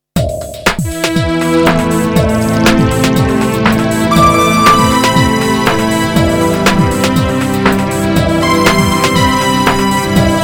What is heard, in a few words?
bowl